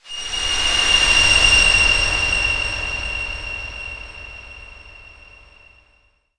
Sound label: squeel